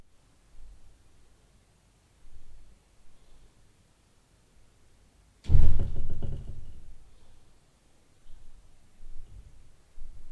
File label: LF PULSE, Night Noise